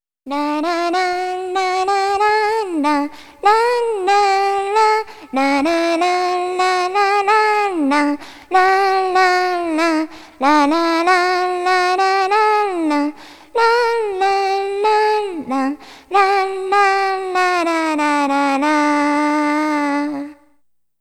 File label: Opening Song (Girl's Solo); Harvest Moon Back to Nature